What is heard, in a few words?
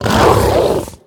Attack; Reffuh